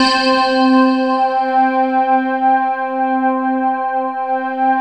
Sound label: World of Synthesizers, SYNBELL